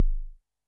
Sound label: korg trident